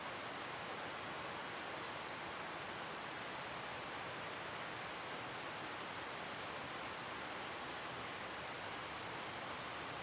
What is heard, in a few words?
ambiance cricket chirping songs